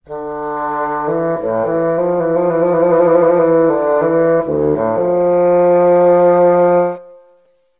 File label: bassoon, The Bassoon is a low-pitched woodwind instrument; having a long wooden body attached to a U-shaped lateral tube; NH Philharmonic Orchestra